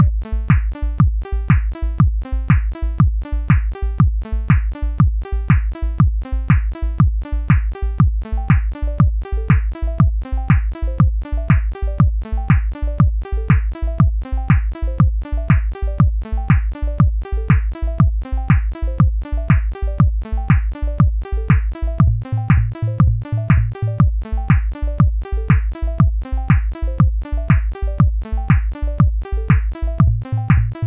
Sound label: beat